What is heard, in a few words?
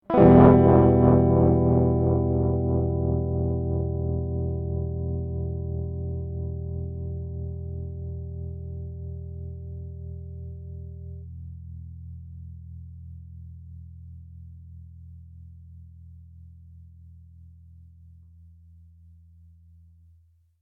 Notes R Em Up; gamerip; Hylics